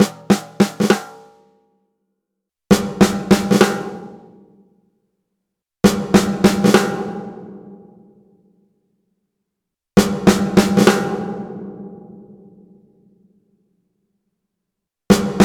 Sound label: Sony DRE 2000 Real Snare Sequence